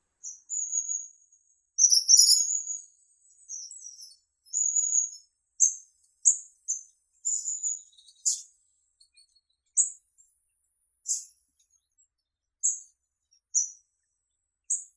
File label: sinitsa dlinnohvostaya opolovnik aegithalos caudatus